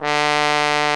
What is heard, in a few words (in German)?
POSAUNE BASS D2; Best Service; Alpine Volksmusik